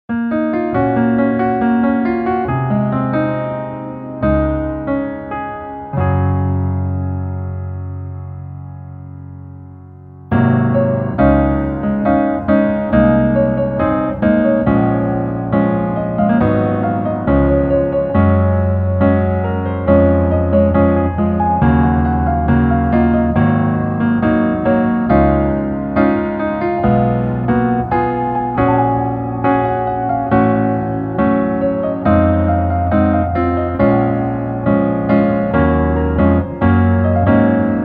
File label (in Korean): Piano ver, 멜로디MR, 남자키